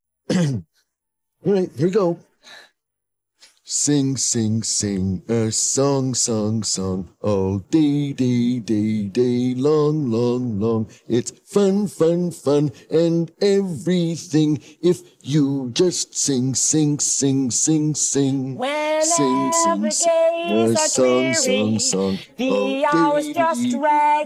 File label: songs together vocals